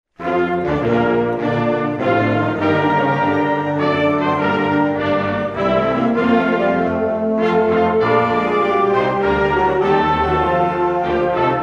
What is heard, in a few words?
National Anthem; KOOL FM